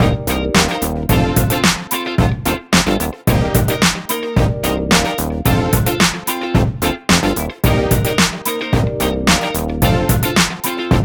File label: Full Mix, Nu Disco, 110bpm Cm; Zero-G; Construction Kits; Hittin Hard